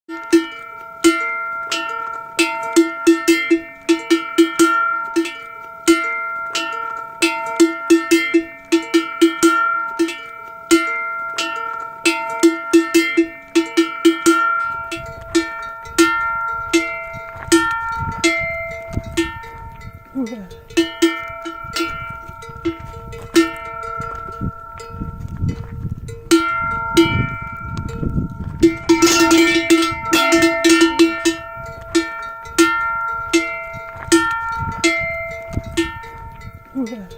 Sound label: walks on grass bare foot; ASMR; your waifu